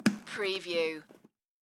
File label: Tupperware Close; shut